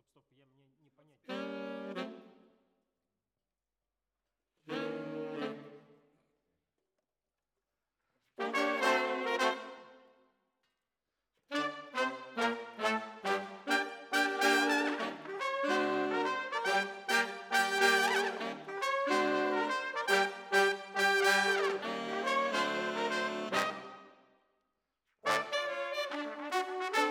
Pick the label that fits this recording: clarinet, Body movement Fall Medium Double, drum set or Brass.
Brass